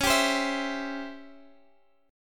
Db Minor Major 7th Flat 5th Db down Guitar Half Step Down